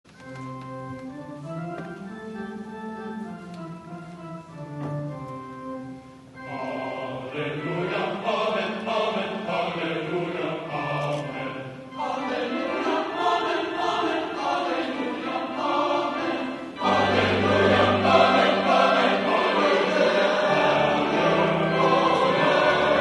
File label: Hallelujah Amen